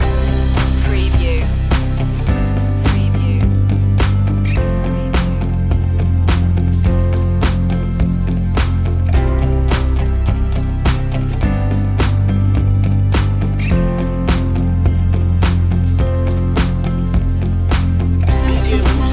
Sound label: best motivational song loop